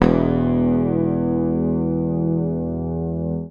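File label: bass, E1, right channel; PICK; Sampleheads; Will Lee Bass Library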